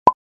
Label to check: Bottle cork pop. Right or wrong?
Right